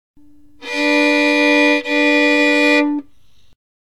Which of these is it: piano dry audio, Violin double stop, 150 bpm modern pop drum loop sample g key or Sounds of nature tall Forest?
Violin double stop